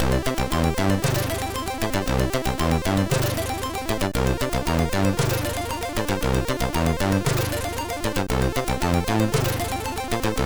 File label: Melo Funk, MOS6581R3